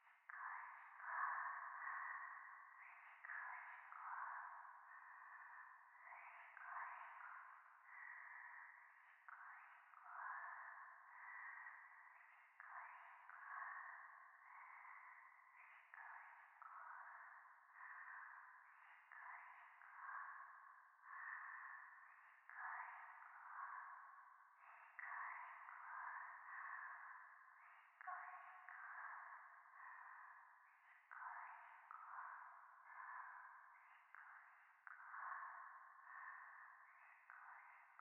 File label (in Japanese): 廃墟 しこしこ